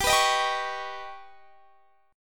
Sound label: Eb Augmented 7th